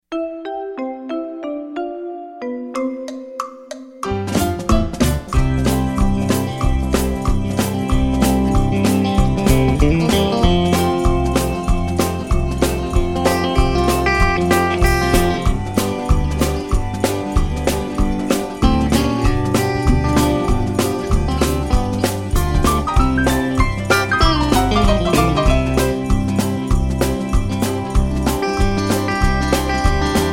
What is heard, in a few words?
TV Guitar Up